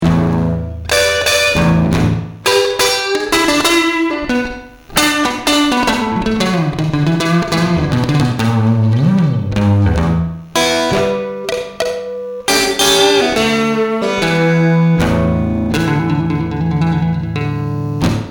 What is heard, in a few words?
pen blues